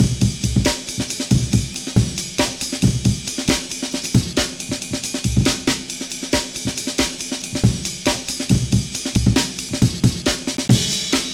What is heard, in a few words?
13 8 Amen 4bars 137 49bpm